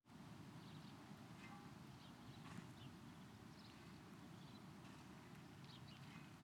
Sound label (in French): Pépillement Grillon Pelle, Friche Industrielle Rhinau